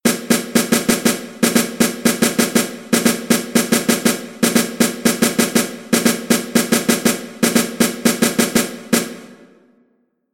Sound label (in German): 3 4 Takt binär ternär